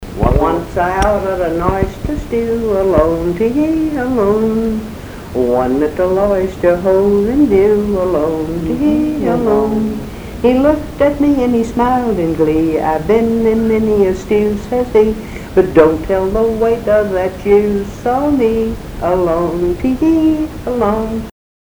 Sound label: mothers oyster song